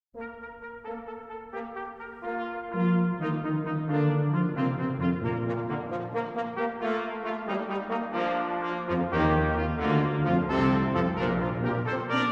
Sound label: Festive Fanfare